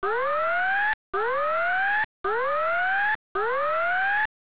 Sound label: red alert